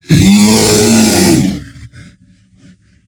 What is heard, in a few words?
bear aggro warning; npc